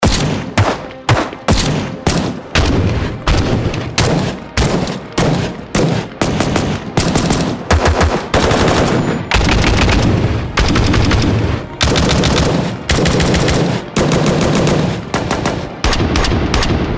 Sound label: GUN; Cartoon Game Sound 2.0; JBoB Sound Pack Demo